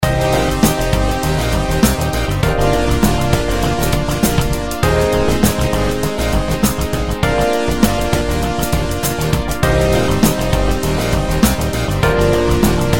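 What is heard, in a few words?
Harpsichord, Electric Keys, Wurlitzer; Groove; RetroMagix; ElectriKeys; Zephyrus; Syntheway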